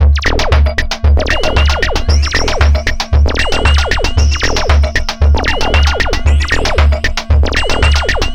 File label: 115 Bpm Stab Loop